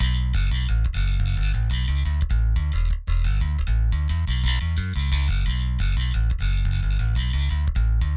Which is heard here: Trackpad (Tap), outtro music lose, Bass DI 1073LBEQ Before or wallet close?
Bass DI 1073LBEQ Before